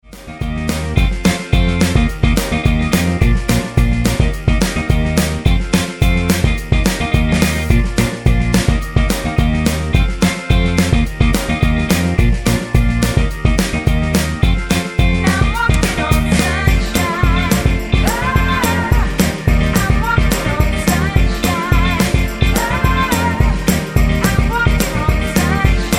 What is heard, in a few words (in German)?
mit Chor